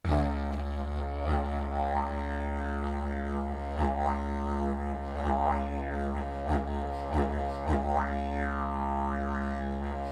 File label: Didgeridoo sound